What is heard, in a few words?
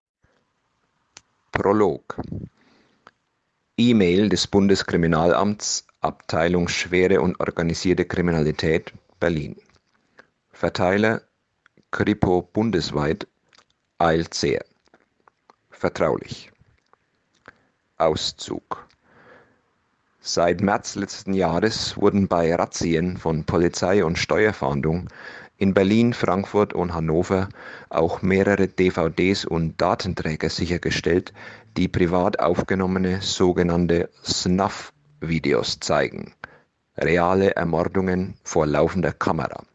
Hardrock